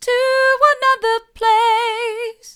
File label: to another place mid, vocal